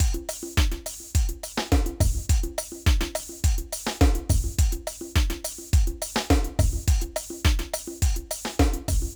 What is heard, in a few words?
Dance Drums